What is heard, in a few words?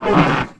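droid hit; Star Wars Knights Of The Old Republic I; XBOX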